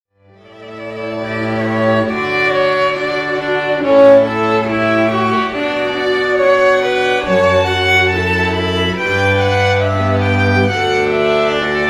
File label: string quartet; little rock string quartet